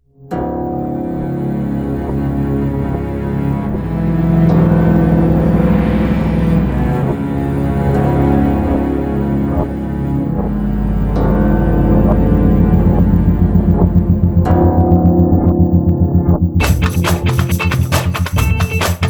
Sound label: Instrumental